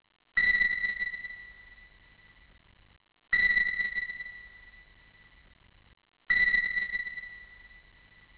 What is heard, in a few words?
many ping